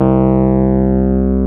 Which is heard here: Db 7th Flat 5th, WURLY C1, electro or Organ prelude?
WURLY C1